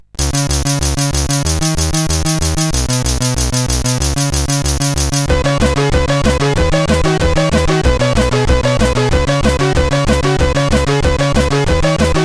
Cool Compo Tune, CSG8580R5

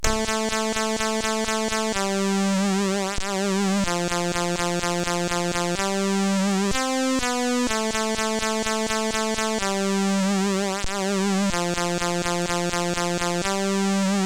Game Over, sid CSG8580R5

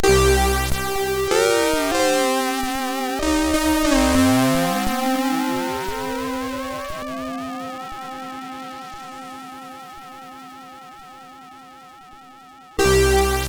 Jingle, MOS6581R3

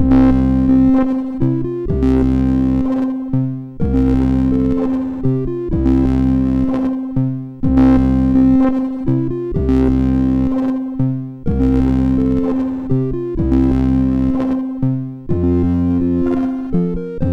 MOS6581; MOS6581R4

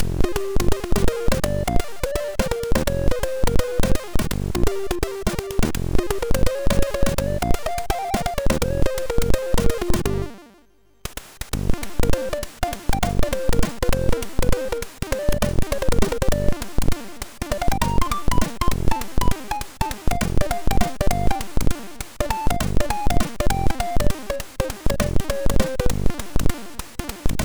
Jazz, sid MOS6581R3

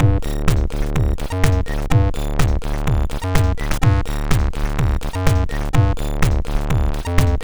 Orange Electron MOS6581R3

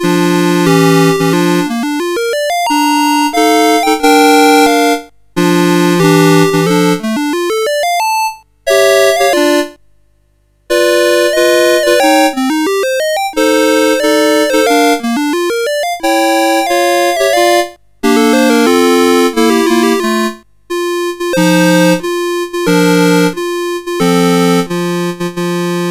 Bridal Chorus, CSG8580R5

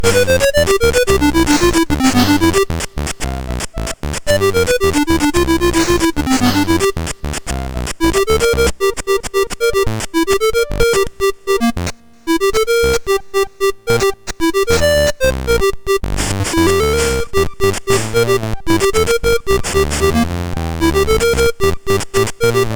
Bebop, MOS6581R2

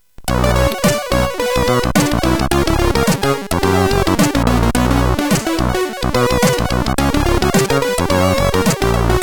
JAZZZZ, CSG8580; hvsc

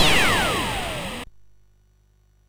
Final Synth Sample, MOS6581R3

